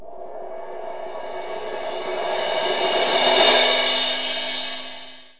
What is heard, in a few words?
cymb